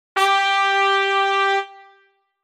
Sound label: trumpet